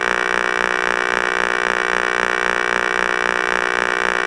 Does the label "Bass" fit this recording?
No